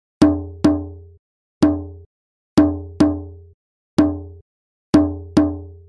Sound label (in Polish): bęben; starsze przedszkolaki